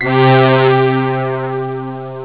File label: ping; xfce